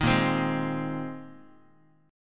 A sharp 7th Suspended 2nd Suspended 4th, down, Guitar; strummed; chord; JGuitar